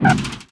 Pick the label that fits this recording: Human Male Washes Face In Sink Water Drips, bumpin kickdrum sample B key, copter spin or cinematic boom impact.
copter spin